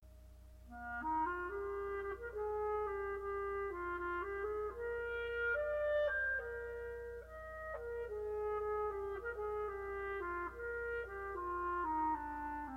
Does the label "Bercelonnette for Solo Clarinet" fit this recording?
Yes